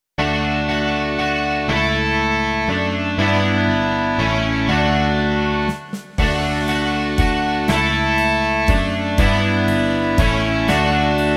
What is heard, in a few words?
rock